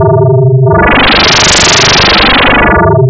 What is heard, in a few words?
blurp; nyquist